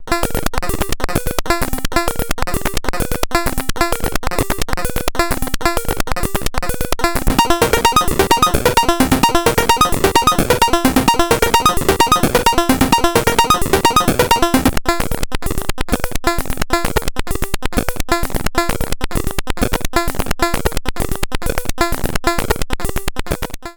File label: Multi Function Discrete VCO PWM Carrier tri saw down saw up; ACL